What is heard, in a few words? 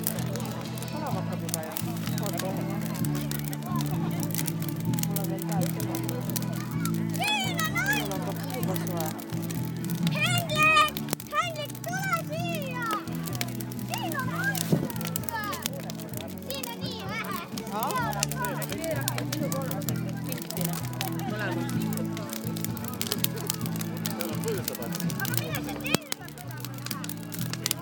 huge midsummer day fire and party noises